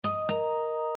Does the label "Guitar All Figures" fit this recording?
No